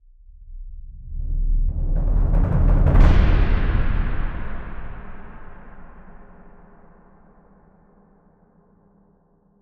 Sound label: Roll Gong Hit; Cinematic Trailer; Hits; Wagner; BlueFX; Bonus - FX & Transitions